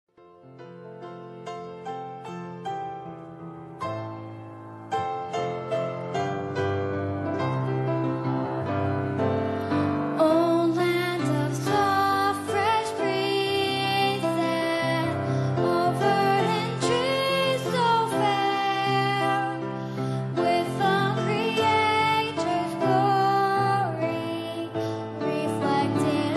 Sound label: cayman islands national anthem